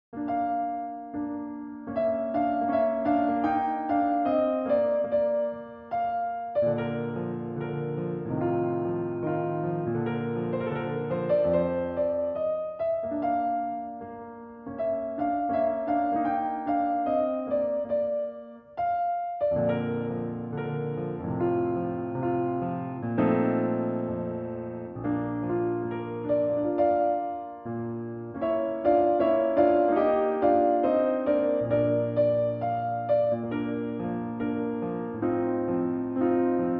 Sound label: Piano Concerto, Solo Piano, Romance; Piano Concerto No. 20 K.466; Mayer-Mahr